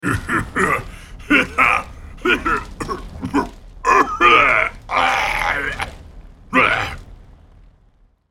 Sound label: laugh; ZombieBrand